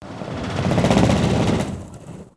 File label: rambling roof